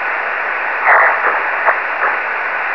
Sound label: twin double